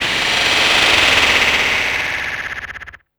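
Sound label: sound effects, 160 BPM, Drum & Bass; Ueberschall; Drum & Bass Flash Trackz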